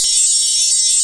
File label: W CHIME; Ensoniq ZR-76